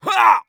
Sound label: hit; Escape from Tarkov; scav5